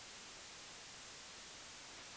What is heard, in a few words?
noise